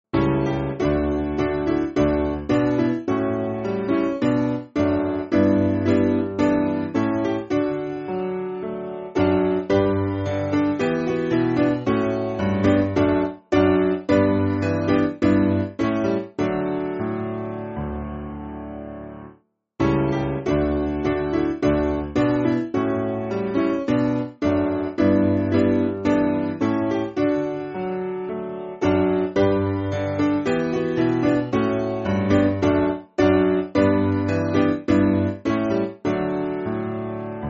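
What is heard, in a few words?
Piano, Music Backing Files for Churches; Your Words To Me; Capel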